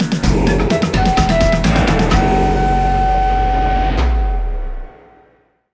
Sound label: Gate Jingle; Snake Pass